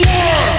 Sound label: one drum; The Epic Collection 3; Epic Marketing; muzak samples